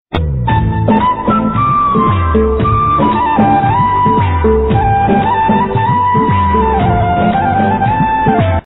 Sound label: Romantic Flute